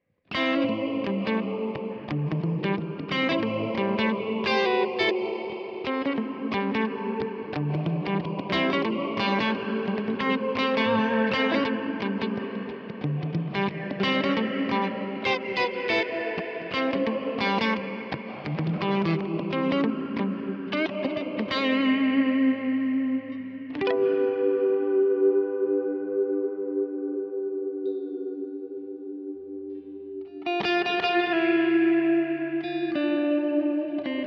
Strymon Big Sky Plug in Articulate Chorale Software